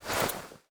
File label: weapon draw